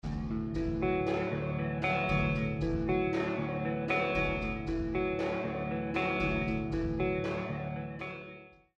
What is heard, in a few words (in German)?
flanger lfo2 phasenverschoben